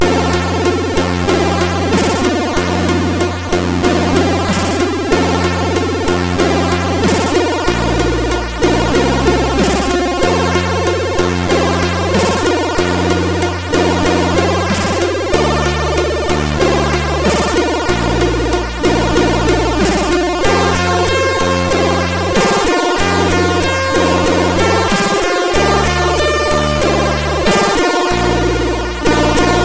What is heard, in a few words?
Heavy theme, sid MOS6581R4